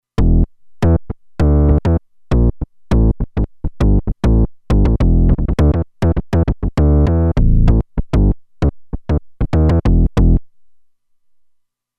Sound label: Syn Slap Bss, Roland Alpha Juno-2; Factory 2